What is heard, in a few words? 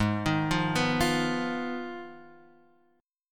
G sharp Minor 6th G sharp down